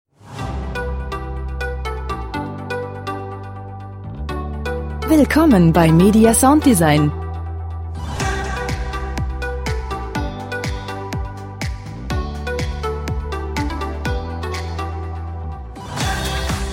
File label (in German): gute laune